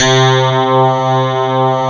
MIDI CLAV C3; Big Fish Audio; Synth City

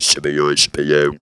"chibiyou", Funky Vocals; USB Soundscan vol.18; TB MELODY

